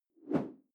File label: whoosh simple short